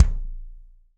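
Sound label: BD DRY, bass drum; Real Mega Drums; Best Service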